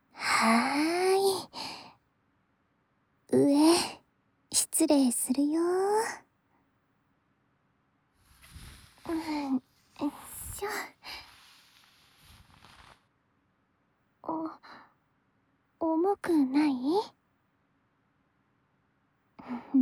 『肩と首の付根マッサージで就寝準備』, ASMR

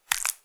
普通, ぱちゅ音単発

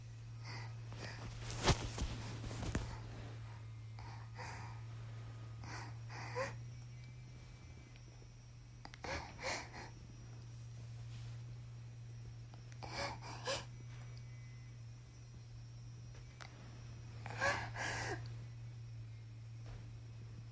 22歳 喘ぎ声と逝き声

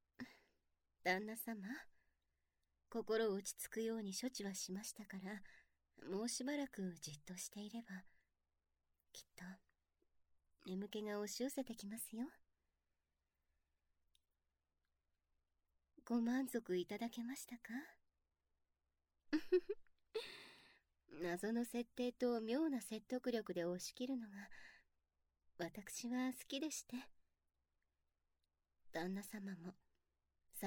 少しの添い寝

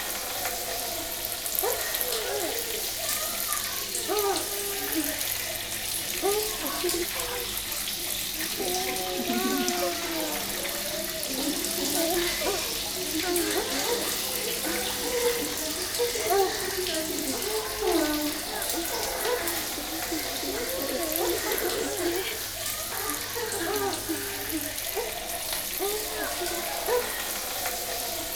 お風呂場, ループ; おしっこのみと編集版

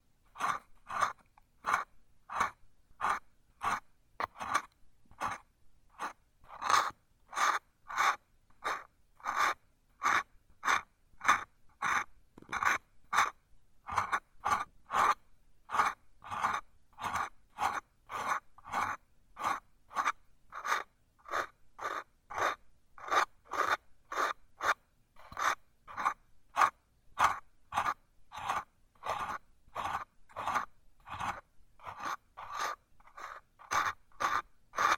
耳かき 左, ASMR

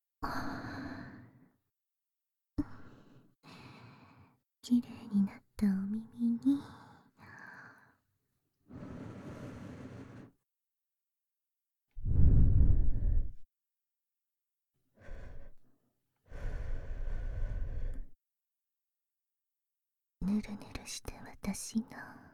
耳舐め 手コキ（バイノーラル 効果音あり）

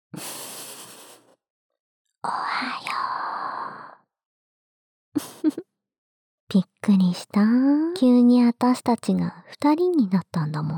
ダブル 囁き 耳舐め; ギャルJKと援交えっち！?

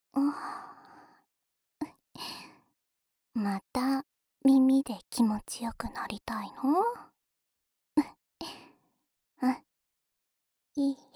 安眠用お耳ふーふーループ（両耳)

